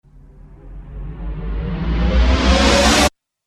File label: Riser Abrupt Stop Chorus; Rise And Dive